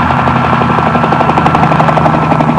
CHOPPER; trasporti

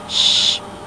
SHHHH

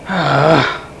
FRUSTRT, gemiti esclamazioni mugolii; persone

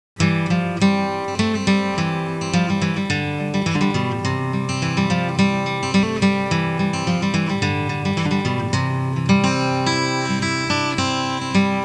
suonerie musica traditional usa country; american

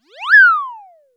ZIP, cartoons

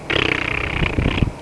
TONGUE

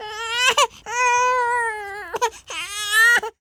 CRY, baby

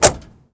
DOOR CLOSE, auto; trasporti

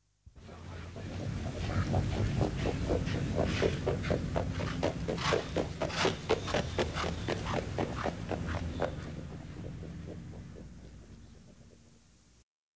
cavalli horses; animali

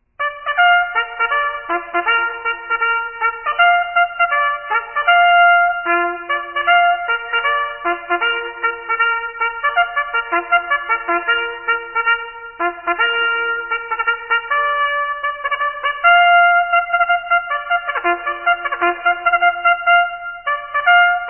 Bugle Call - To The Colors; armi

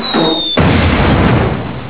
cartoon fall; cartoons

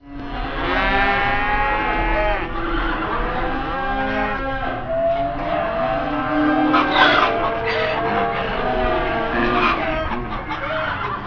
bovini cow bull; animali

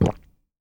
GULP

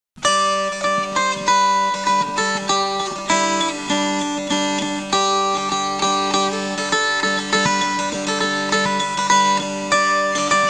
suonerie musica traditional usa country; american

